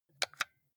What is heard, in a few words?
console controller xbox xyab press